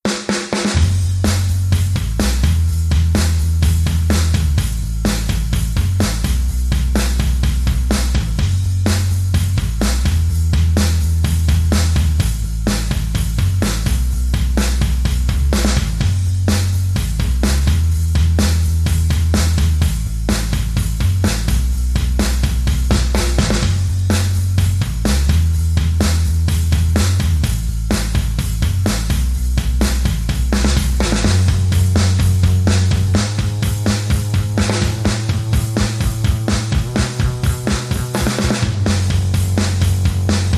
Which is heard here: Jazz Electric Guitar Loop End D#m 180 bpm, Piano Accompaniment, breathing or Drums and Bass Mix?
Drums and Bass Mix